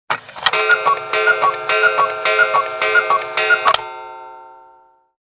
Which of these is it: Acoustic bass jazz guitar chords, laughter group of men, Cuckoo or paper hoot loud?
Cuckoo